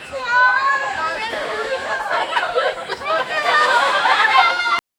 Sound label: lively party atmosphere